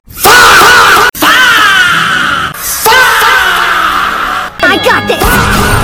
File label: faaaaaahhhh multiple